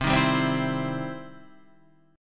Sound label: E Minor Major 7th Sharp 5th C